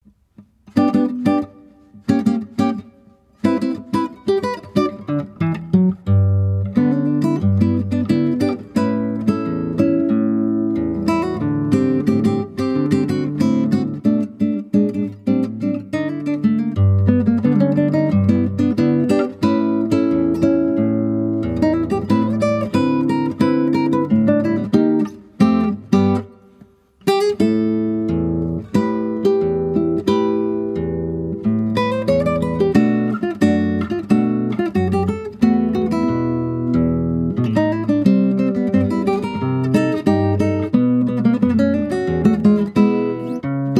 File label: Battle Background Music, guitar; New Super Mario Bros.; super mario series for guitar 2010